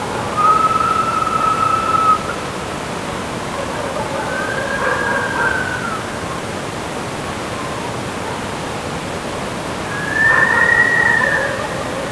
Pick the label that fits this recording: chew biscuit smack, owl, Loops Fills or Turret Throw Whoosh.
owl